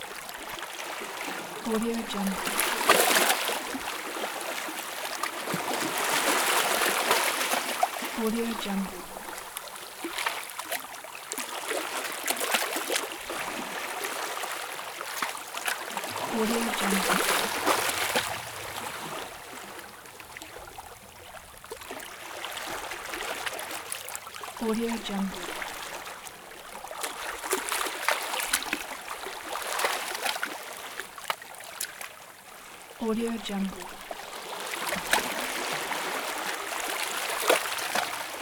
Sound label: waves lightly lapping on rocks